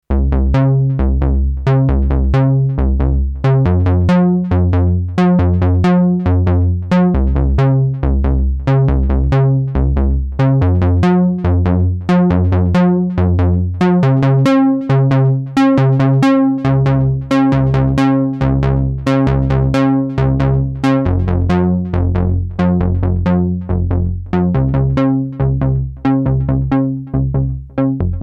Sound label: PWM Bass